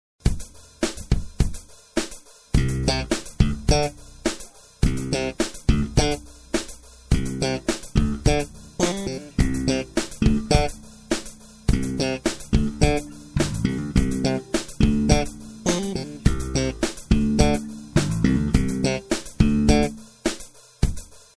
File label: slap